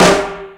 All Snares, Drum Machines

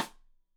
Side Stick Stereo; Deeply Sampled Drums

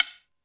Lo Sticks, Alesis D4, Drum Machines

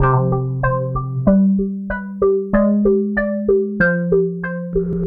Melody, IDM

